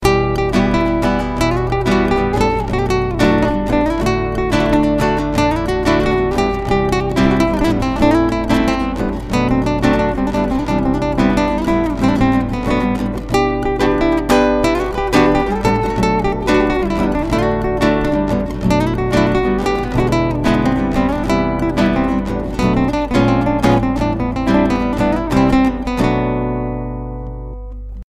Guitar

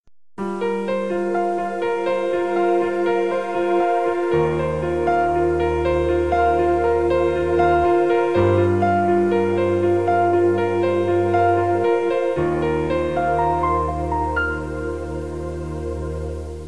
soothing tone, ringtone

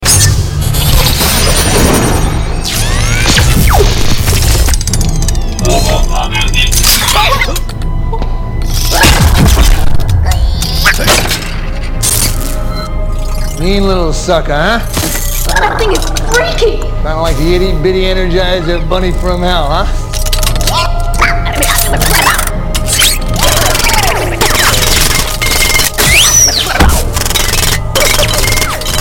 Angry Transform

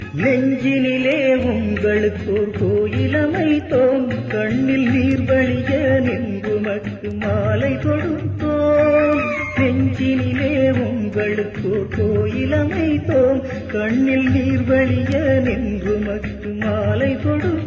indian music

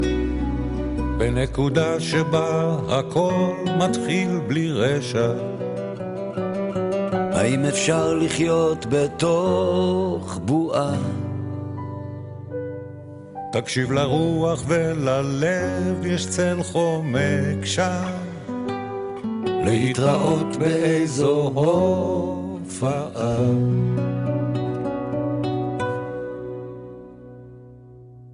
duet; classical

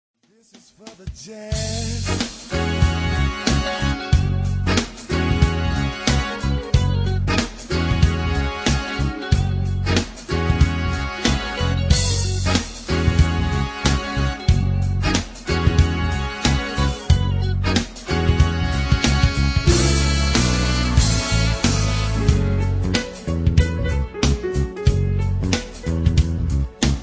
jazz

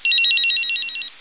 cellphone ring 3d; ringtones; sms

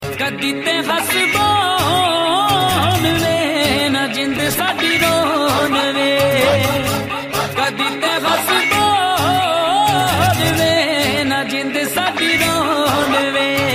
punjabi music; ringtones

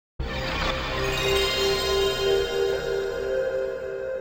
windows original

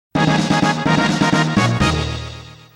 Third Fanfare

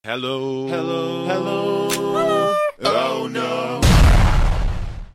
Barbershop Quartet

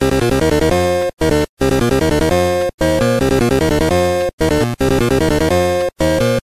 NES Ringtone; BTTF

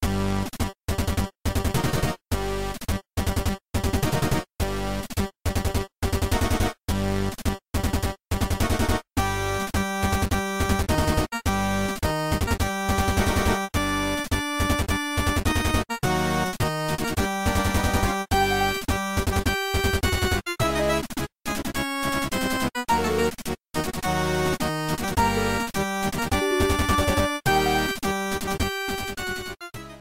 Darth Vader 8 bit

